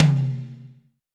big tom drum one shot d key, Royality free tom sample tuned to the D note.